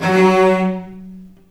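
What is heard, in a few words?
F#2 arco long cello, left; East West; The Ultimate String Collection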